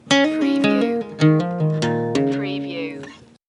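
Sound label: Acoustic Blues Riff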